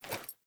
Foley Action Default Sword Throw Wind Up, Foley Default Clothing; Characters; Player